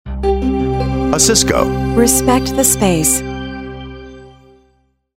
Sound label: Jingle